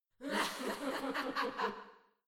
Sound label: Laugh; Lust Princess